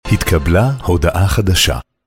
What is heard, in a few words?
A Message Received